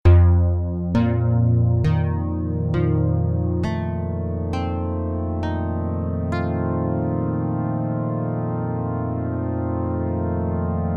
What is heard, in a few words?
Unison 2 Mode